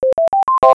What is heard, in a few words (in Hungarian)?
akkord